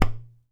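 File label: IPU GOURD, HAWAII PERC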